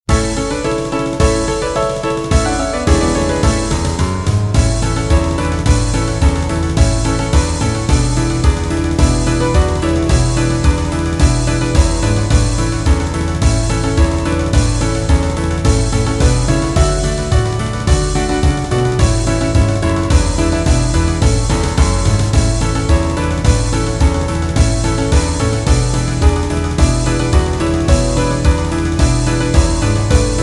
zirkuslieder karneval im zirkuszelt playback

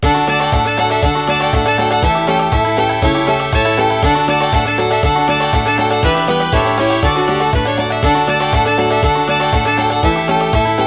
zirkuslieder playback

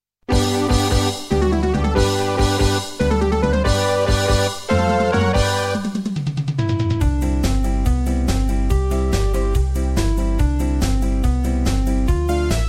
zirkuslieder mein hochverehrtes publikum playback

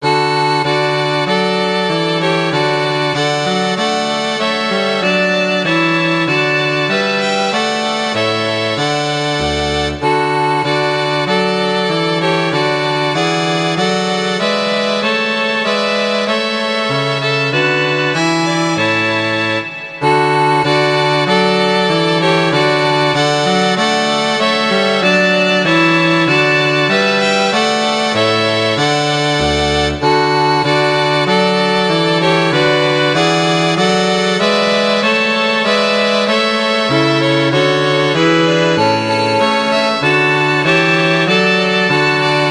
hoert ihr wie die glocken klingen streichquartett

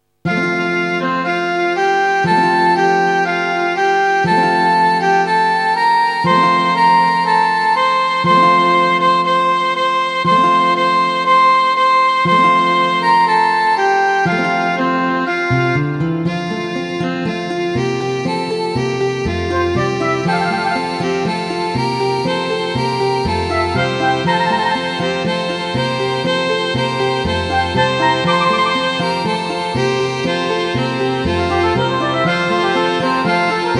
zirkuslieder liebe leute kommt herein kanon playback

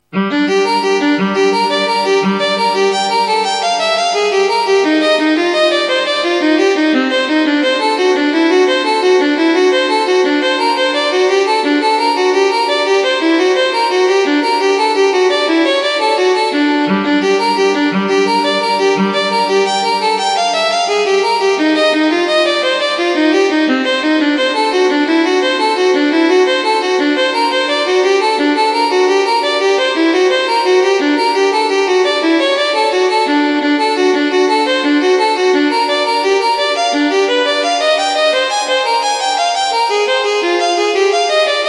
praeludium g moll; nach bach

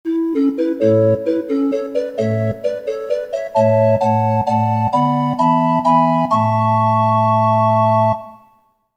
orgelfanfare

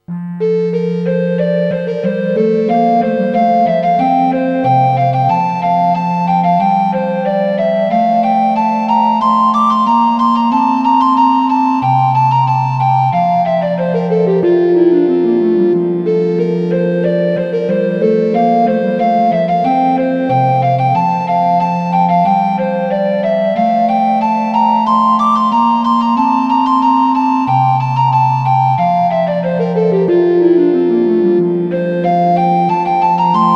nun danket all und bringet ehr, orgel

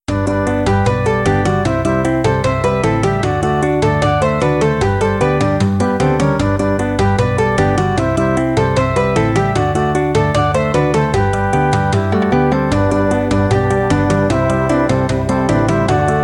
zirkuslieder playback

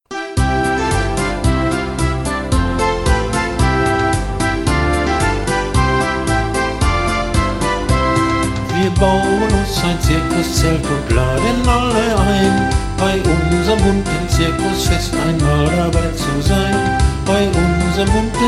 zirkuslieder

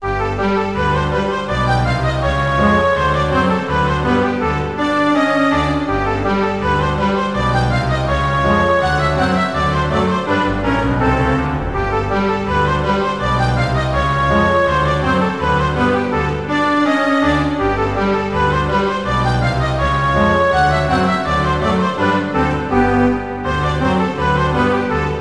spielmusik orchesterpartitur

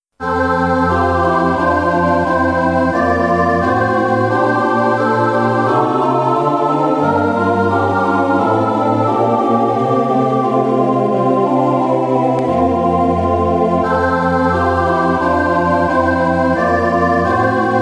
weihnachtslieder es ist ein ros entsprungen satb chorpartitur; vers 2

